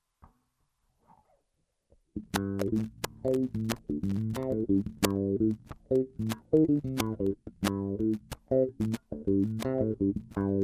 wah; Snarling

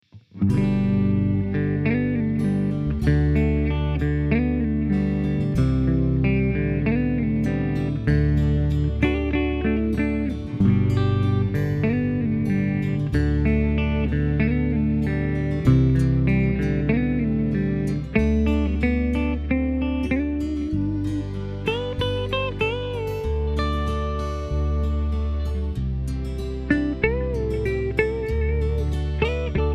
Guitar

